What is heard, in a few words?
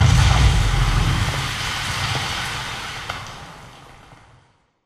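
generator destroyed explosion distant; coop; Titanfall 2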